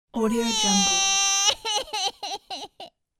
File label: small kid short cry